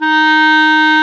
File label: CLAR, Clarinets; Roland LCDP04; 90 s Sample CDs; Clar Cls